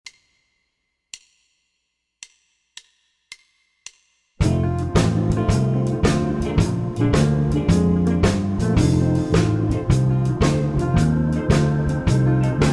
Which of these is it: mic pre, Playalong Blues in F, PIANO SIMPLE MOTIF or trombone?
Playalong Blues in F